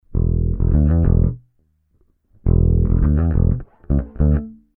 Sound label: BASS